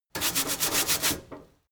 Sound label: mechanic sink brush